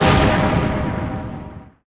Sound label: SPELLS Destroy; Puzzle Chronicles; PS3; PSP; X360